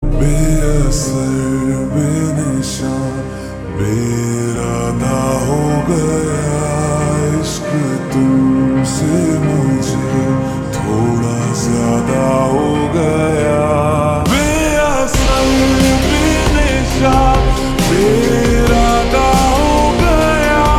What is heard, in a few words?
Slow Reverb Version Ringtone